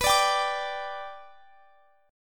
B-Minor Sharp 5th

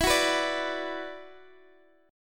E 7th Suspended 2nd, down, Guitar, Open D

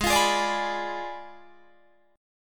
Ab Diminished 7th, down, Guitar, Drop D; strummed; Abdim7 Chord

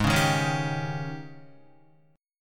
G sharp Major 7th Suspended 4th, Guitar

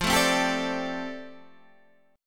F 6th Add 9th F down Guitar Half Step Down, chord

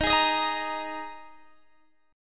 D sharp Suspended 4th D sharp, Guitar DADGAD; chord shape

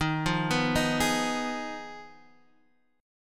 G sharp Minor 6th D sharp

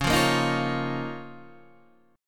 Db 6th Add 9th Db down Guitar DADGAD

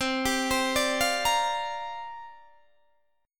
C 9th Suspended 4th C down Guitar Open D

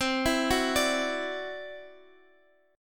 E 7th Suspended 2nd Sharp 5th C, guitar

